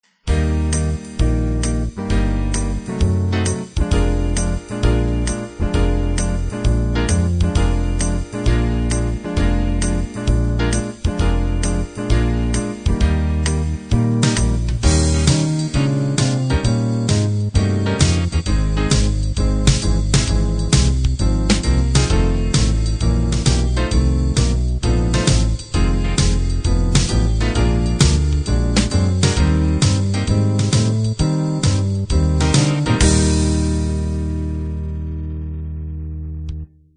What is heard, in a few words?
cool jazz